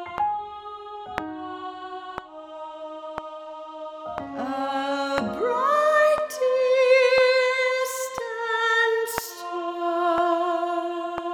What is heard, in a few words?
a bright distant star soprano